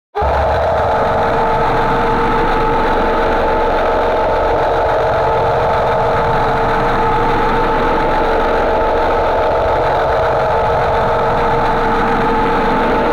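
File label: dark warp worp loop scream pain; music and sound fx; music and sound fx master collection; nova sound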